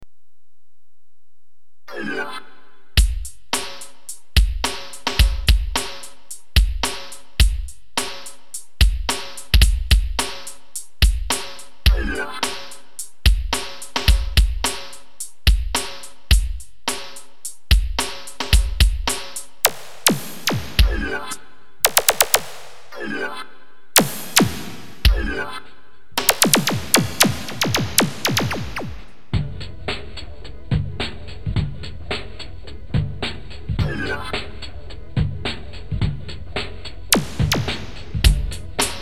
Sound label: Drums